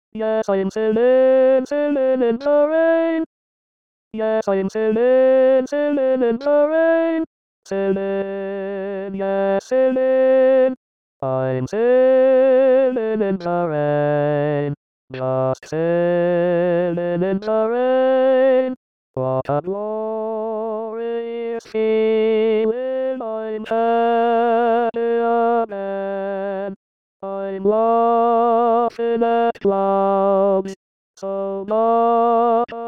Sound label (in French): t chante